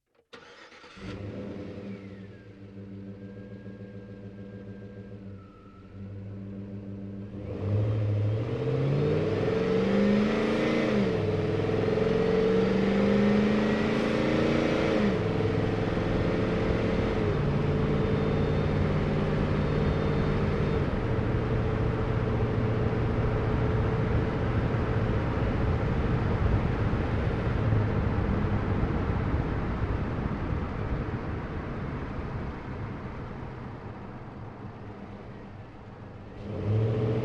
Truck GMC Savana Onboard Drive Accel-Decel Med Fast; Trucks; Big Room Sound